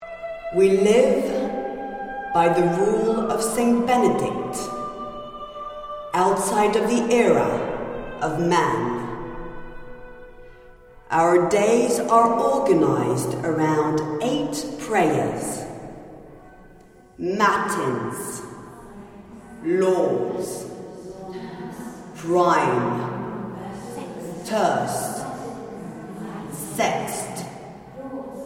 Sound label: Music adventure